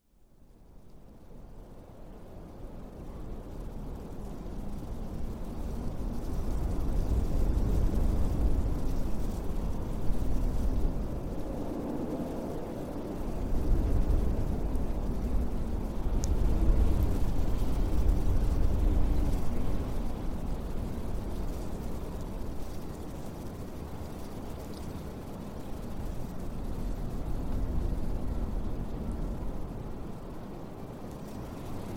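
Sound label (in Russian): атмосфера зимы ветер с ледяными частицами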